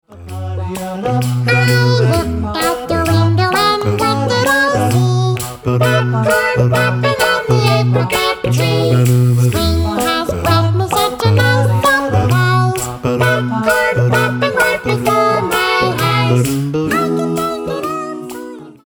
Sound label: popcorn